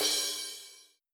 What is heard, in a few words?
crash